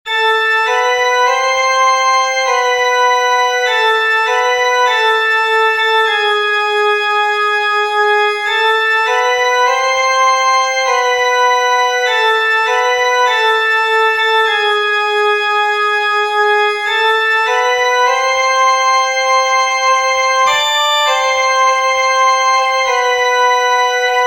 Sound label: Sopran; Perosi; O salutaris hostia; SATB